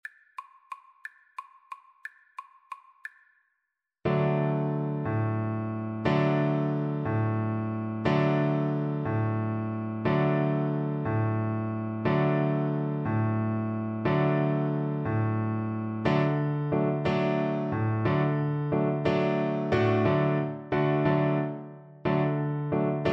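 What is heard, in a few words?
viola karaoke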